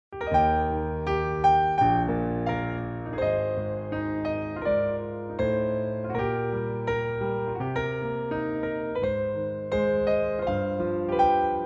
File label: Eb flute